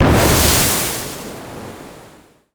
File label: ship miss small effect; Hearts of Iron IV Death or Dishonor